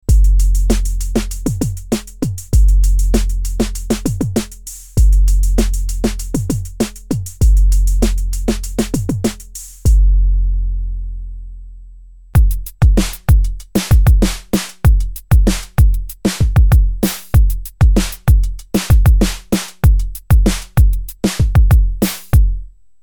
downtempo

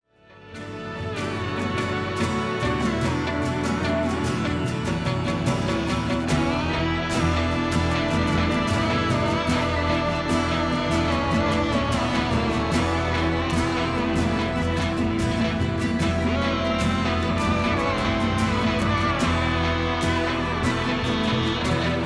Blackstar out in

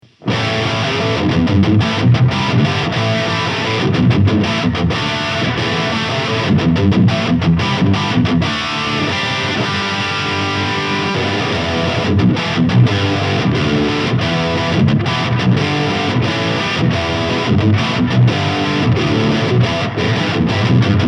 Ibanez AZ24047 Lead

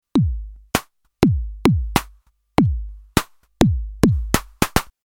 bassdrum and snare; soma the pipe

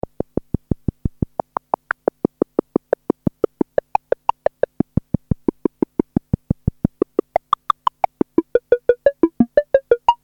EMS Logik

